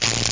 SCORP; PwrSlave

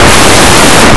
ashtray; Blue Heat

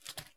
step hero; Geschicklichkeit; Dino and Aliens

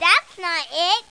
bummer

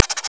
Scribble; msartist

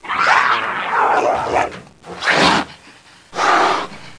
trex; GEOGRAFIA; velo

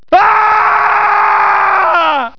scream; The Ultimate Guide to Mortal Kombat

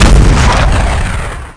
RLAUNCH; Cube

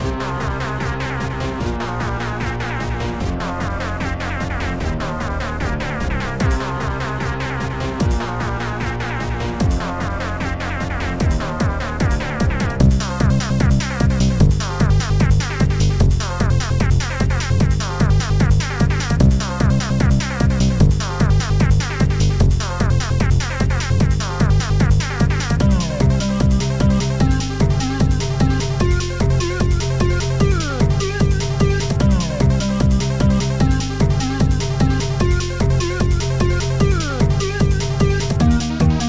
techno

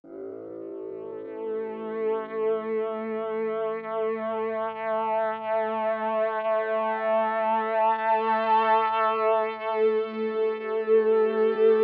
SYNTHPAD ETHNO 125 A; eJay; Sphere

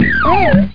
Dive

